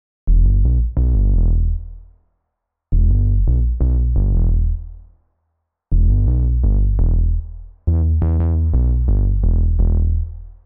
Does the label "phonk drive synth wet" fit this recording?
Yes